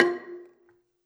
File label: Gambang Hard Beater, Gamelan